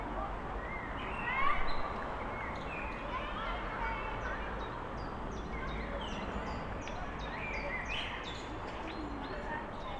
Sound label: screams